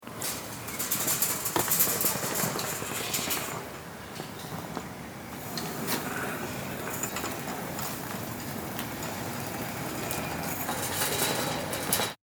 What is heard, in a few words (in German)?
Gitter